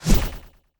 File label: Tech Whoosh